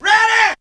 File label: VOX; ZERO-G; Datafile